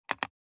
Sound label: Logitech mouse click